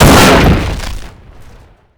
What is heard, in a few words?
bazooka impact armour; WAR